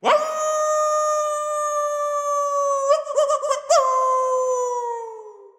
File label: ambience wolf; dead or alive 2